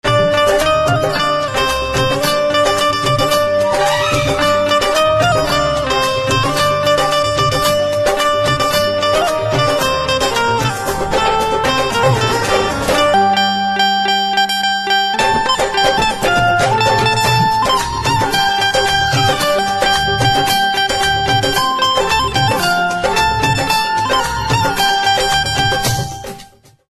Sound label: Banjo